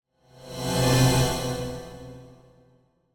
Symphonic Hit Drawing Close To The Fear - Fast Pad; Hollywood Edge; sound designer's toolkit v2